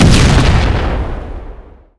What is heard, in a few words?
Explosion Nuke; Etfx